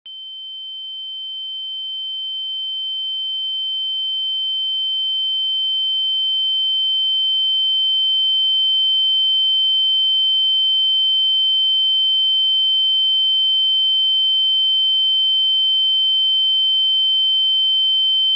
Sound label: ringing sound